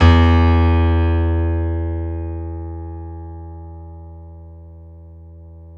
PNO E; Back In Time Records; Korg Universe VOL-1

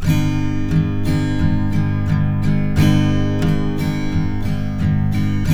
Guitars; Spectrasonics; Hans Zimmer Guitars VOL-1

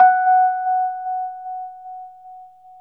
RHSM F#, Rhodes; 3D Sound; 3D Keys VOL-1

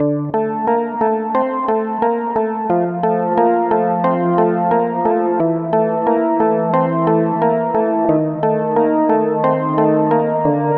Wheel Rocker Keys; Sonic Foundry (Sony Creative Software) - Club Joints and Street Anthems; Time Traveller

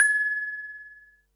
Perc, Percussion and FX; Pearl SC-40

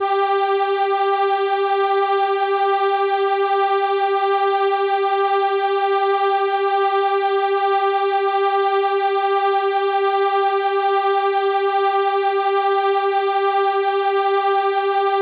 Trumpet G5, ARP Solina

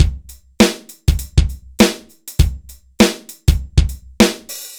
dry Loop, BPM 100

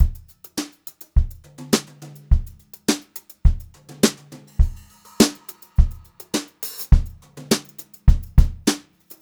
104, Brushed Drum Loops; USB Soundscan vol.39; AKAI

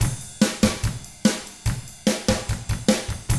rock drums

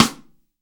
BRASS RIM, GM drum set; AKAI S-Series CD-ROM Sound Library VOL-8

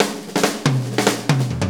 4-4 Fill, Drum Loops; Drum Tools; Fills; Sonic Foundry (Sony Creative Software); Loops & Samples Drum Tools

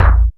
CYBERWAVE, KICKS

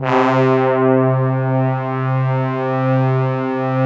brass, synthesizers; SD1 STAR B

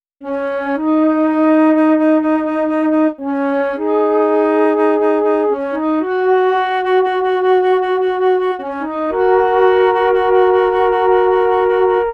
Flute, Cinematic Funk; Sonic Foundry (Sony Creative Software); Cinematic Funk Urban Tone Poems

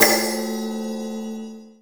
CHINESE PRC, PERCUSSION

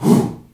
HOO, SHOUT

